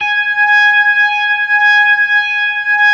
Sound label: G#5S, B3, Vintage Keyboards